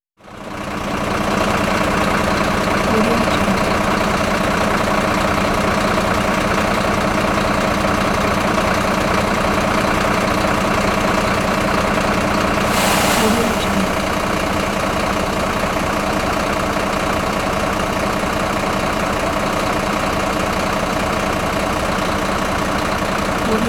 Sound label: truck engine; sounddivision